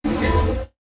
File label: Buttons Mechanical